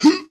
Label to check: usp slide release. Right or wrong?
Wrong